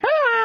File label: HURT; Nejlepší České Hry